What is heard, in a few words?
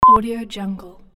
censoring beep short